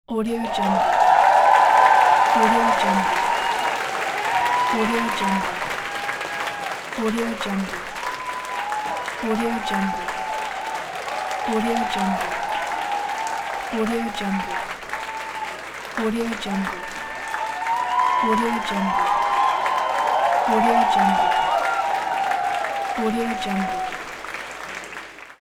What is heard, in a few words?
applause